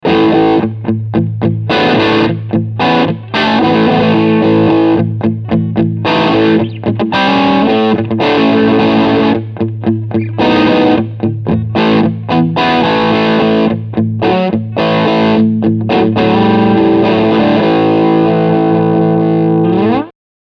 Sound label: Tube Squasher Medium Gain